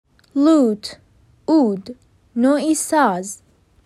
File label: Lute